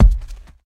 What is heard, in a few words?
Rock Dirt Hvy Thump